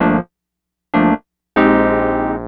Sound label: Electric Piano, Loops, Keys